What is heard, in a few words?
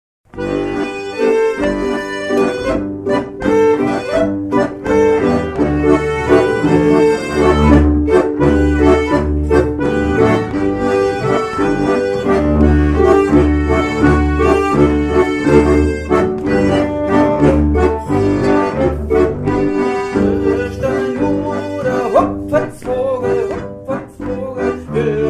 Hupfedsvogel; Giersberg Sep2010